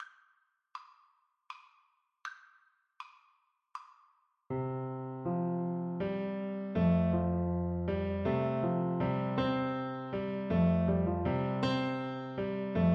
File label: handel water music kar, Flute